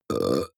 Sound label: burp short; soundpool